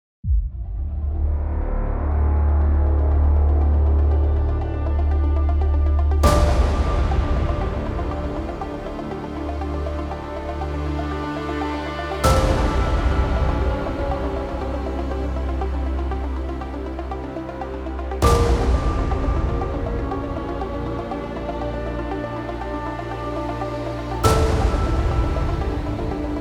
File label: Trailer Brass; Musical Sampling